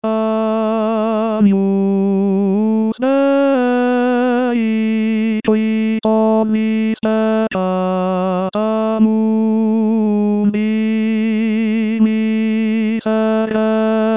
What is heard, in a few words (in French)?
Agnus, Chorale